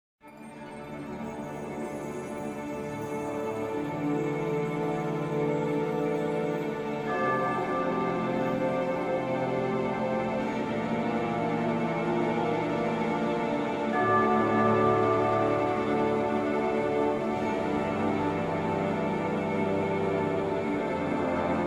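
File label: Music Cue Secret Cimbassi; Crusader Kings III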